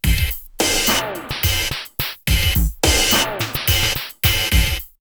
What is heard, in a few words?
107, drumloops, Techno&House; X-Static Goldmine; 90 s Sample CDs